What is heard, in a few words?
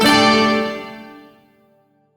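Shawzin Pentatonic Major Chord; Music; Warframe; Duviri; Chords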